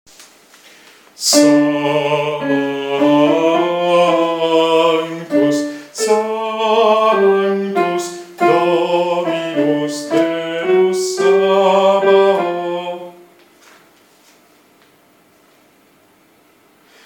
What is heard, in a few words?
Sanctus Contralti; Lotti; repertorio polifonico